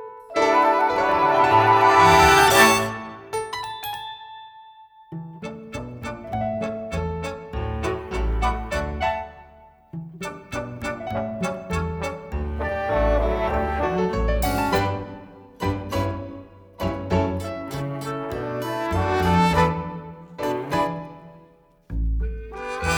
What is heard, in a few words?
Audition Cut Instrumental Only